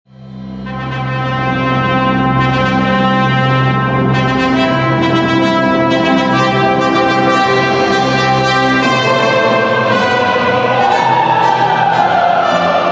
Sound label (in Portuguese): marcha coral 10 vozes